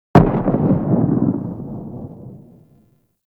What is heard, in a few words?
Arch Grn Skiff Drone Dist Explo, Explosions; Warframe; Archwing